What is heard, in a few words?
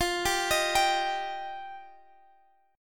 F 7th Suspended 2nd F